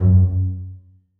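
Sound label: basses pizzicato f#3; Sonatina Symphonic Orchestra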